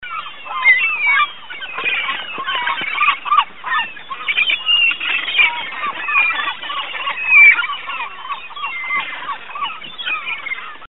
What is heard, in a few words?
Seagull Sound Effect